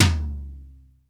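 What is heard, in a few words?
dry tom, dry kits; Crypton; DrumBase5000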